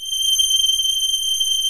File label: ANALOG STRING; OMI - Universe of Sounds